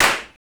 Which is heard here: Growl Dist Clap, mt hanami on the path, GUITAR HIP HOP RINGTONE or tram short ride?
Growl Dist Clap